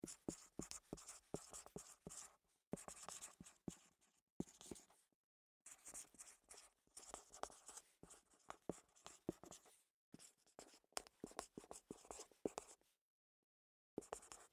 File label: Office Dry Erase Marker Write On White Board Long